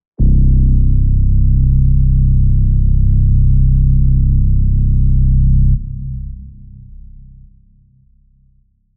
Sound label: bass